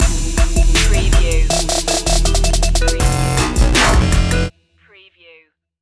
anomaly stinger

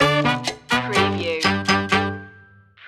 latin fiesta on the wall stinger

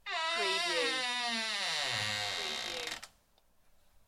Door hinge squeak

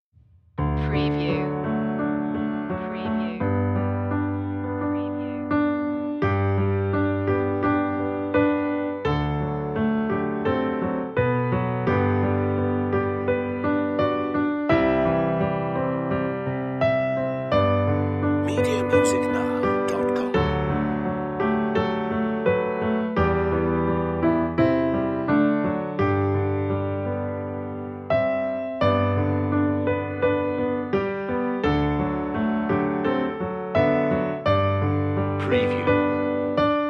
auld lang syne studio piano 85bpm

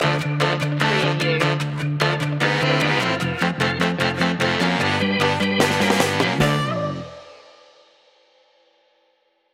ska stinger

